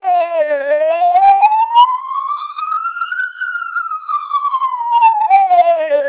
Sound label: siren twelp